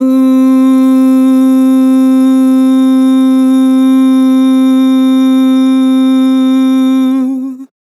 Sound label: Vibrato Ooh, Vocals; Harmor Vocals